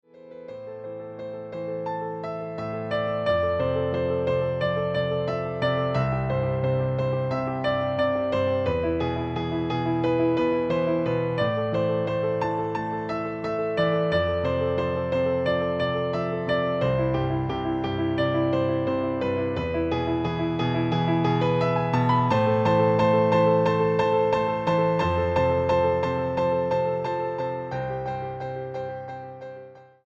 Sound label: Spring rain